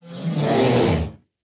panda grunt; battle

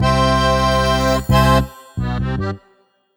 Valse; CrossCode

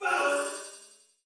crystal enemy; battle

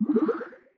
bubble sound; jellyfish; battle

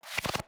cards fold; boss; gynthar

